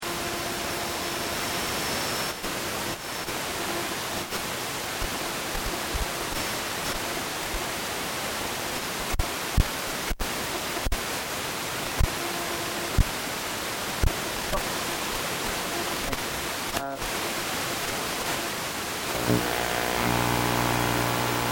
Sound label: Sony Brick Radio Tv H Tuning, Radio or television tuning; no clear channels; LYN095 package of Noise & Static